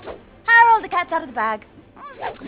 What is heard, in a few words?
female laugh